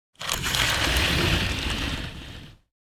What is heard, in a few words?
Heavy fabric curtain slide rail rattle; household